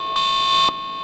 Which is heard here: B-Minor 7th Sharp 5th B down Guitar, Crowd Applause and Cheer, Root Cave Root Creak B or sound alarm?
sound alarm